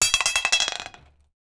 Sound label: very heavy hollow metal, clanging